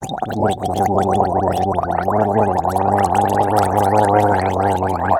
Gorlo poloschut

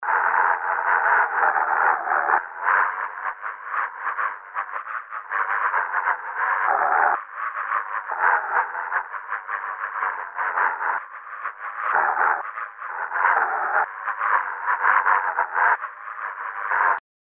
Pomehi strannye golosa

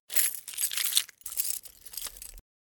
Kljuchami gremyat

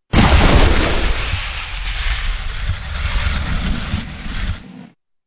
Pryzhok s tramplina

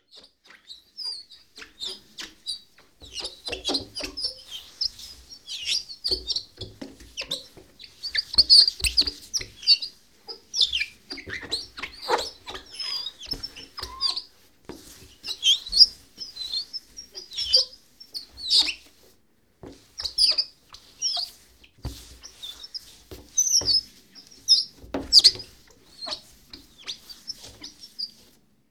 Steklo trut so skripom

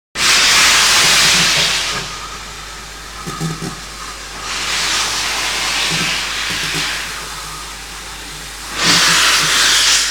Vypuskaet par lokomotiv

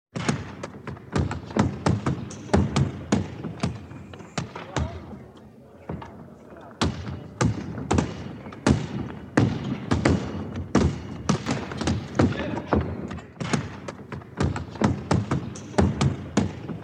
V basketbol igrajut

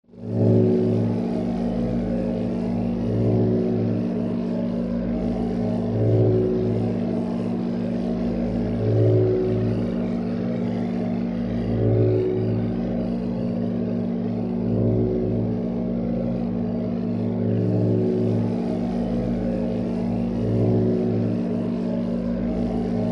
Zaschitnoe pole

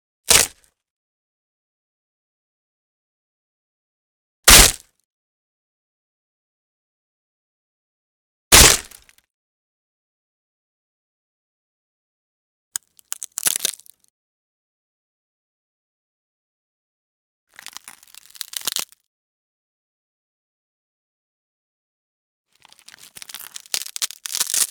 Doski lomajut

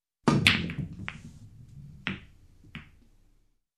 Razbivajut bilyardnye shary

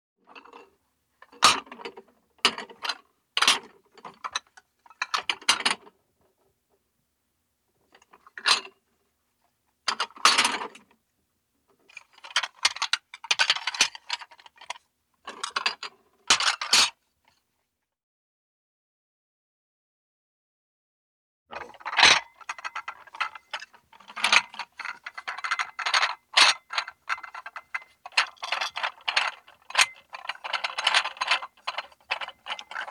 Tarelki

